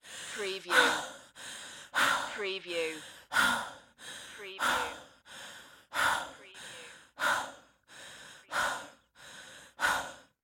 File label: Breath Heavy Long; sound-effects-bible